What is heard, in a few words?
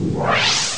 kill blast; Amiga Soundtracker Sample Packs